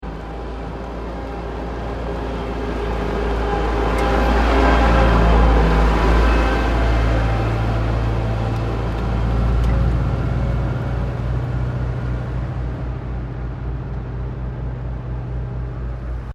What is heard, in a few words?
Construction Vehicle Pass By, Road Construction in Sannohe